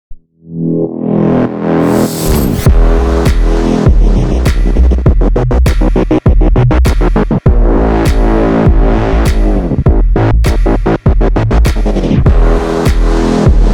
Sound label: Background music for car commercial